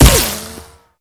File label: bullet hit rock